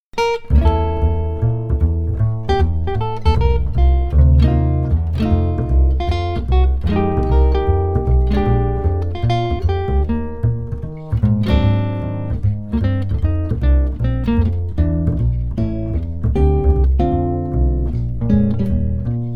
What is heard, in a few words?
Duo with Bass